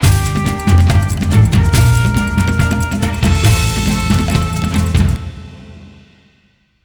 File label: Match Lose; King Hippo